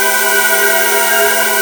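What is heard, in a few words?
G4 voice; Northstar - The Wizard VOL-1; VOXTAGON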